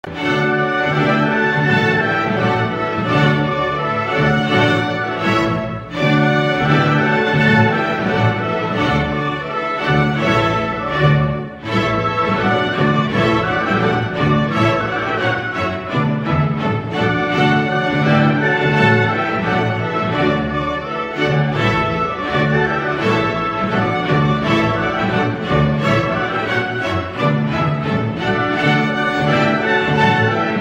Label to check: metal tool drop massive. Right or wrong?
Wrong